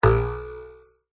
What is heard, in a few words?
cartoon ruler twang metal